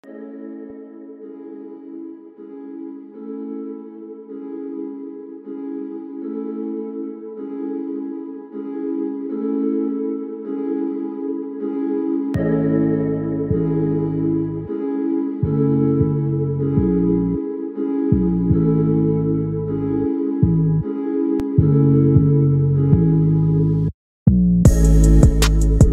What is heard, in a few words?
TRAP hip hop beat; FL Studio Mobile